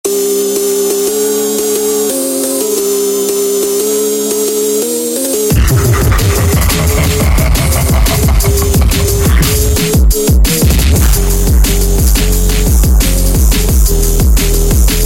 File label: Digital Distortion Phonk